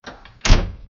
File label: door opening; house